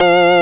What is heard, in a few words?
Organ Hi; Sound Library 2000; Wayzata Technology